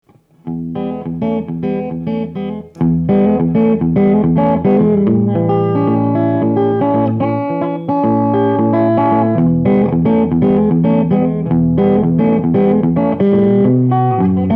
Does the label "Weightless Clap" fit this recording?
No